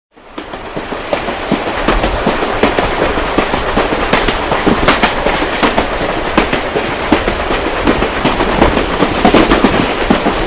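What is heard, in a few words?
sound of electric train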